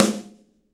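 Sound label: SD SDR, Roland, snare drum; 90 s Sample CDs; Sound & Vision - Gigapack I CD 1 (Roland); Real-Kit s 6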